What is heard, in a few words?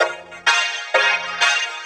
Vocoder Loops 128BPM